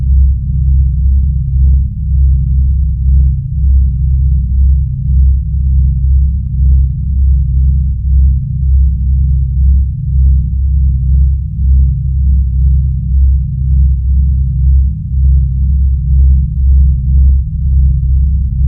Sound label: Ambience; Outer Wilds